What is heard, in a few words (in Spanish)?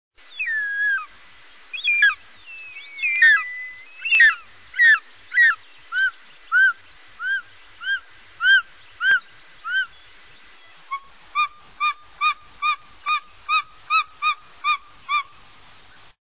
canto aguila culebrera; aves campo